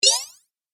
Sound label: Game Click Or Jump, Video Game Sound Effects